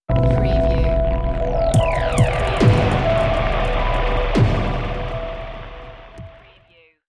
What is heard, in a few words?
Dark Production Stinger